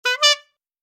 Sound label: clown short, airhorn